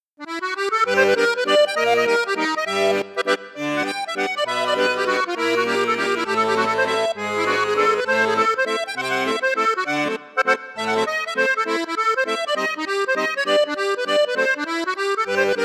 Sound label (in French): registre flute juste flute haute, accordéon; crucianelli